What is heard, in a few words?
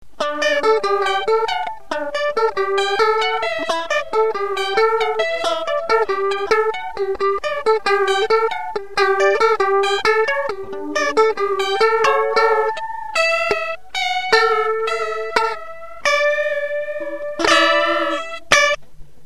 flanger